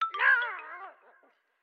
Skeleton Death

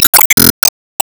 Lob Shot; Disney Star Smash; Android, iOS, Mobile

